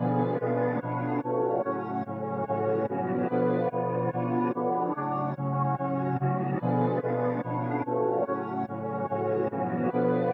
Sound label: 145 BPM F# Min, Melodies; Cymatics; 2022 Melody Mystery Expansion